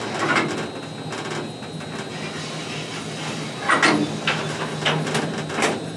turret, tanks; World of Tanks

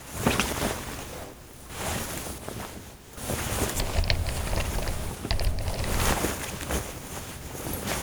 laptop sit idle active; Call of Duty Modern Warfare

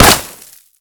Projectile Sounds; Red Orchestra Ostfront 41-45

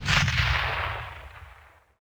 grenade launcher explosion tail

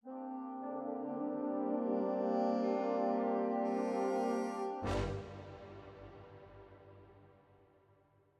music interactive; World of Tanks

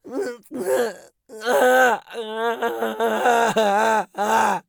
agony; Escape from Tarkov; scav2

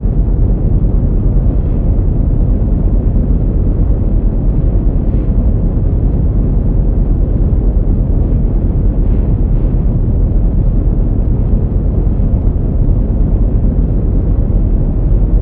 ship engines; World Of Warships

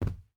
footstep rubber boots cloth jump land; Rust

